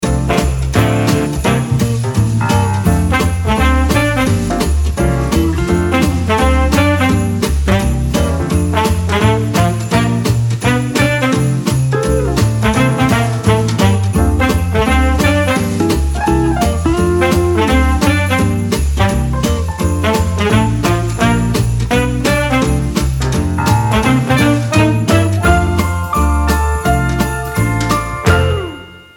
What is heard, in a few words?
Victory; Victory Winterbells